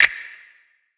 snap trap; Percs

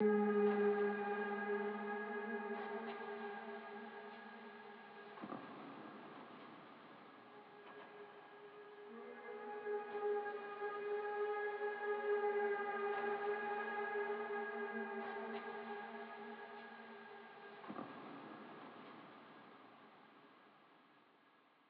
Pad; Boomin-Beat-Starter

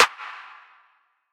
Clap

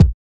Kicks

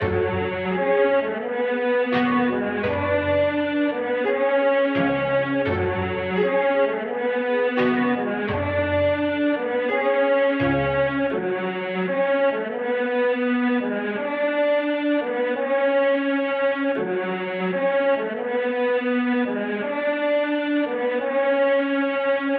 85bpm

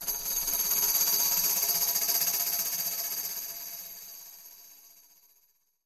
tam, percs

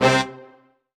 Sounds & Instruments

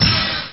Hit

